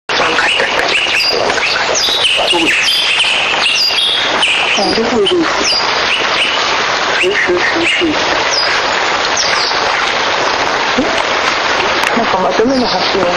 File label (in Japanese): ゴジュウカラ; 戸隠